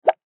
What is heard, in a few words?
Suction Plop; Cartoon